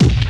Kicks Loud

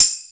Tamborine, Misc Percussion

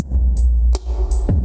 earthquake; Drum loops

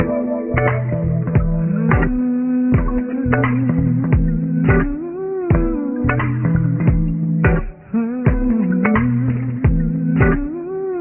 guitar and voice, Phonky Loops

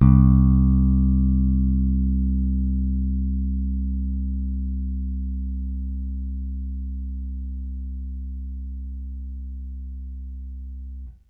plucked, Bass; Notes

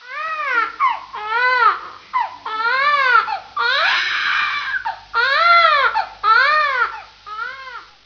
baby

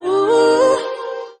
"ooh ooh ooh" SUNG; Voice

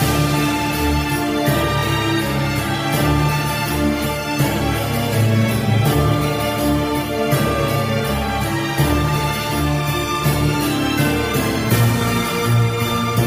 strings, sad loop

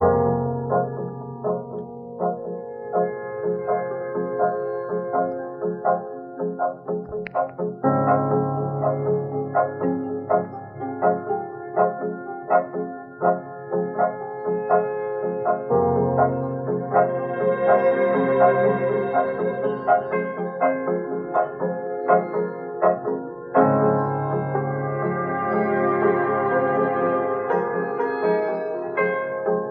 piano, Loops, Sad

